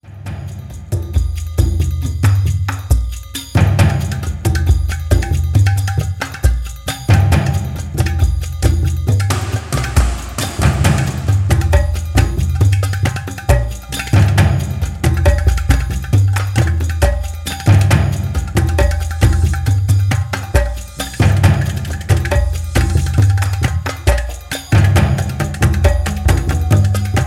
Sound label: relax cargo drum; powermusic